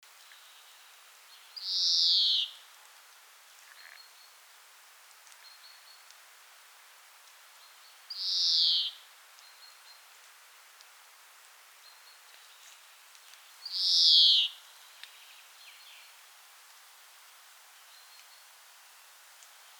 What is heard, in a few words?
verdelhão verdilhão Chloris chloris European Greenfinch; Dwingelderveld Holland